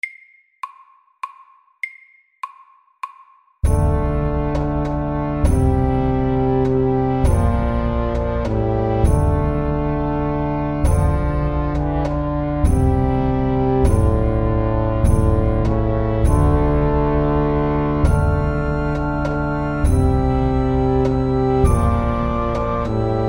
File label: Hemiola